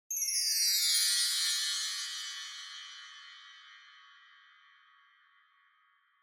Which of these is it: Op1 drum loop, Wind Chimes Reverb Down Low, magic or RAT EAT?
Wind Chimes Reverb Down Low